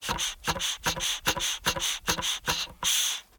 air misc metal canister pressure quick air release suck in; mkh416; sanojsounds; sonniss gdc